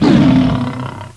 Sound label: T-Rex Attack; Playable Tutorial Level; TR1